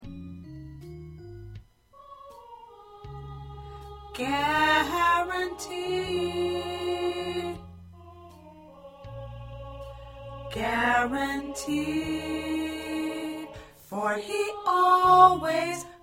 Alto

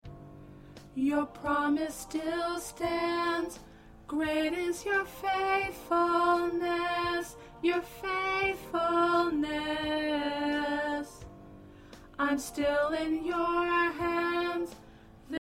Alto, choir

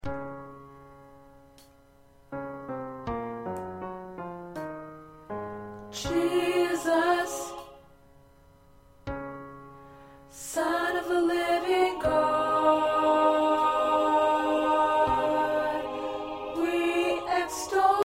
Alto

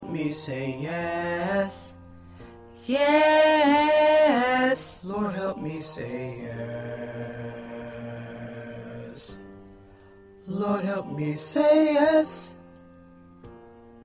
1st Tenor

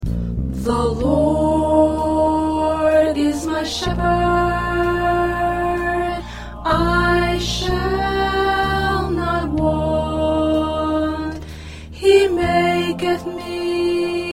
Soprano

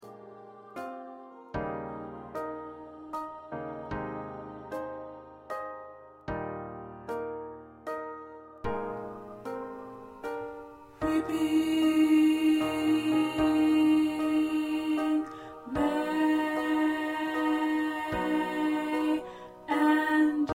Tenor

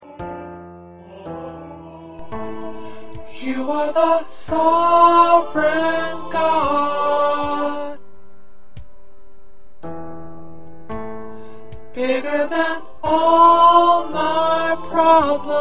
Tenor

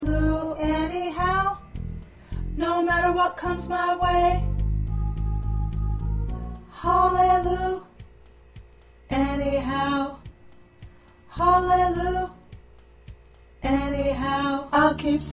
2nd Tenor

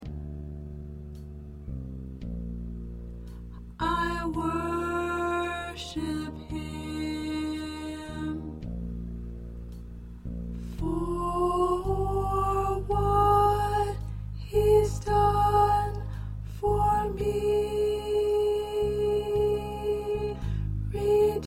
Soprano, choir parts

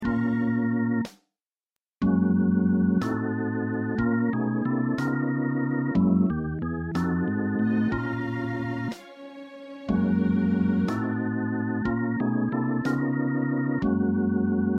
Instrumental

